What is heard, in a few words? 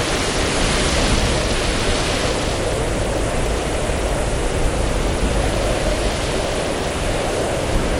ambience snow storm; everness